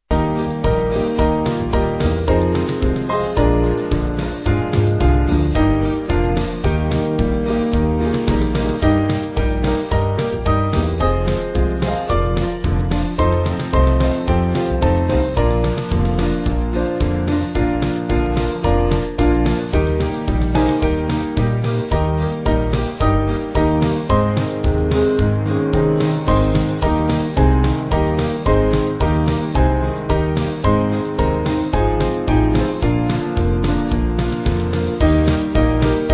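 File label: Band, Music Backing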